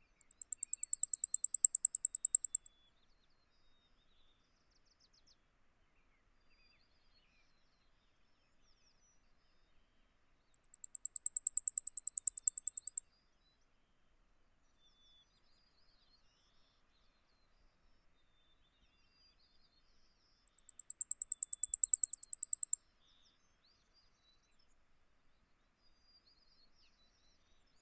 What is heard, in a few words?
blackpoll warbler